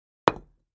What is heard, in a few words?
Patio Block Hit Sledg, concrete & brick